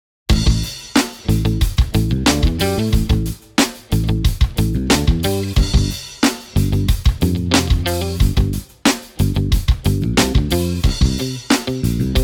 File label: Instrumental